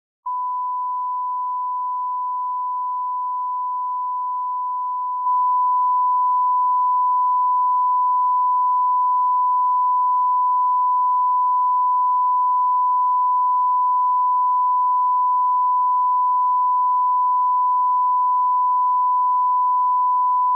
1k Tone; Sony Music Special; The Complete Cbs Audiofile

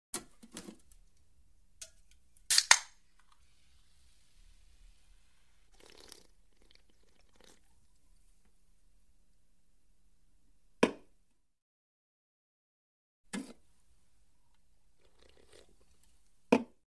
Human Drink From Can; Hollywood Edge Foley Sound Library

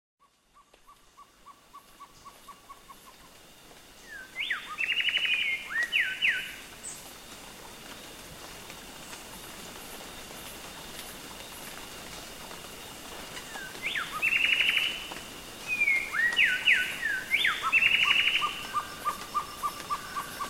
Rain Birds Costa Rica; naturescapes

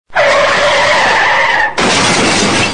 Funny SMS Ringtone

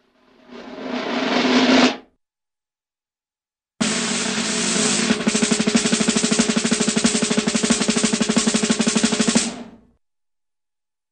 Snare Drum Roll; Hollywood Edge Cartoon Trax

